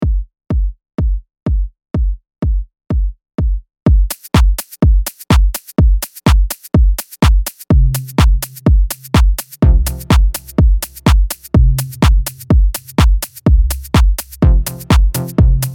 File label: hypnotise drums